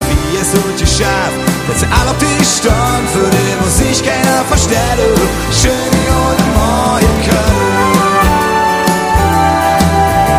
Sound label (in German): live mit orchester